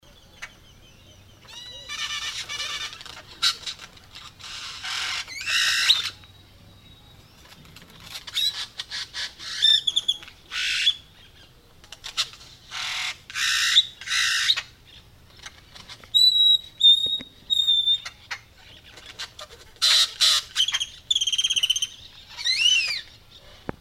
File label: Great-tailed Grackle, Quiscalus mexicanus; blackbirds orioles